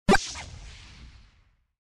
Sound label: fireball; smbx38a; The Great SMBX 38A Contest II; Los Acantilados Rojos